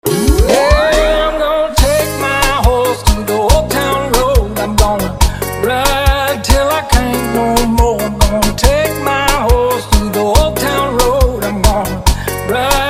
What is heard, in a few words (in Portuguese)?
som automotivo especial reggae e bregadeira